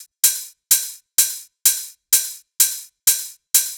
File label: open hh NY house